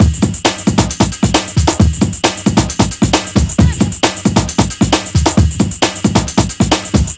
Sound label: breakcore breaks